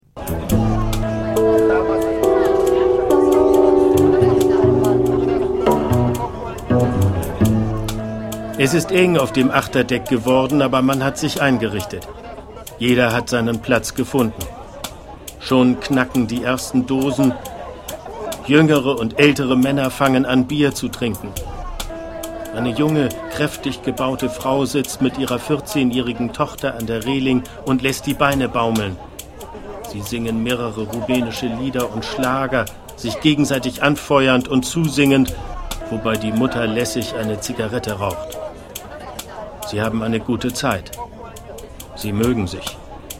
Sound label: taxi water